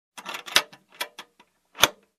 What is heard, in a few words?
Construction